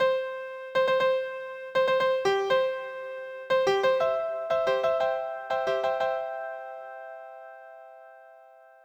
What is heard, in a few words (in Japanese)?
山科ラッパ隊ファンファーレ, 消防団ラッパ隊